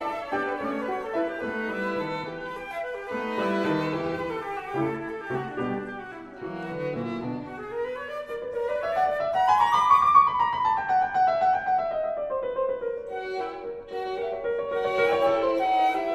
suite op 59 for flute violin and piano iii scherzo